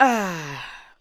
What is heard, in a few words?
ADLIBS